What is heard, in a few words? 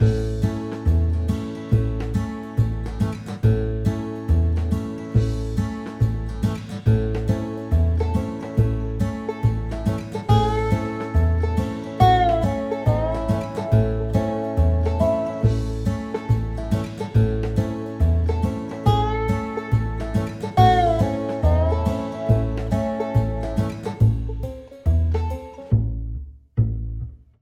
Country 140